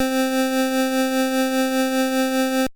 effect tremolo

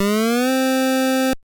effect portamento

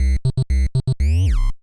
waveform triangle

